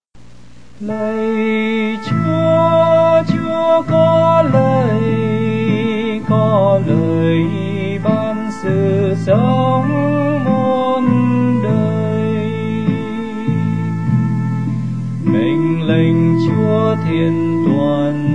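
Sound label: soprano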